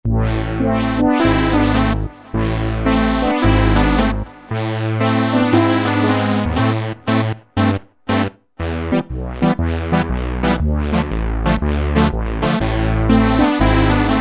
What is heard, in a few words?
Brass; Cheetah MS6